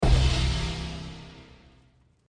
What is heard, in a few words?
Alert Sound